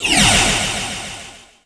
ROCK

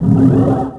ROCK

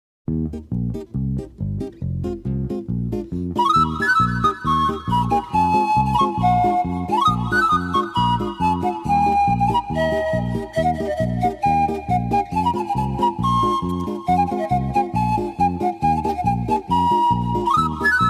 Panpipe Polka; Ren & Stimpy

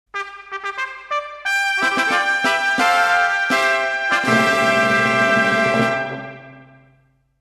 Trumpet Call; Ren & Stimpy

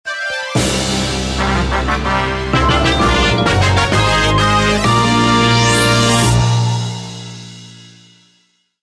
Fanfare, Chiptune; Wii; Super Paper Mario Soundtrack

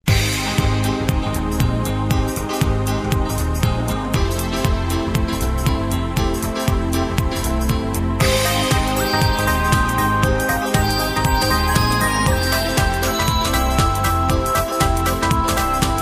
Chiptune Genesis; Sonic CD

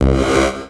ROCK

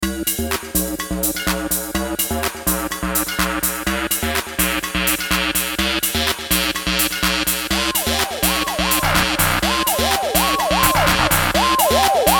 Jungle Beat; Genesis; Streets of Rage Remake v5 Soundtrack

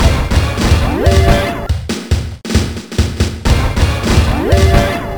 grid beat

